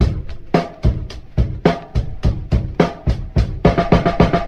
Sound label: funk at the end, Boombap drum loops